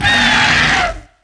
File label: hieracosphinx hit; DAoC; Toa; Gamestar